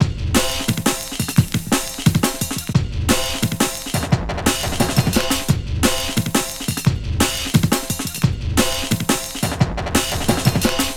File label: Rugged Break, Drum & Bass, Beats & Breaks